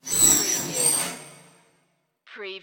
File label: Glass Reverse Movement